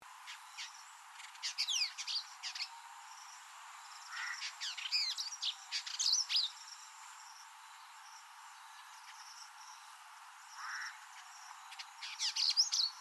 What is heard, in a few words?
Vidua codringtoni; Indigobird Song